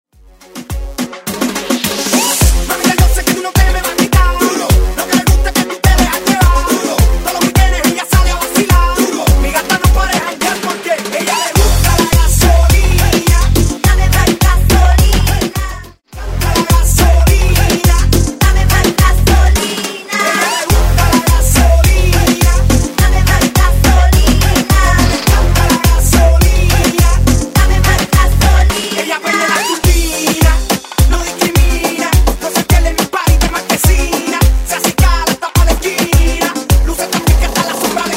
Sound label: Moombahmx Show 105 BPM